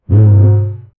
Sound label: deep resonant droning of, low growl